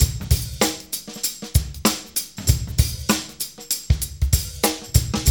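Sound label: 96 bpm, Funky Drums; AMG; Now CD-ROM (Roland); Neil Conti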